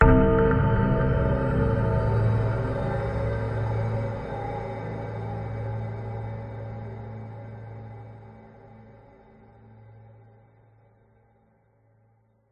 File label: horror hit ghostly bell like chime dark pads sinister evil dark